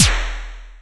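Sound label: Pit Snare; Quasimidi Rave-o-lution 309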